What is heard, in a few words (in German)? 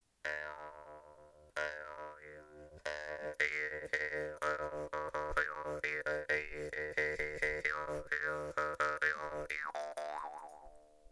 maultrommel mittel